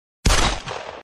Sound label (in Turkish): silah ses efekti sesi